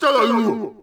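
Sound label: men yells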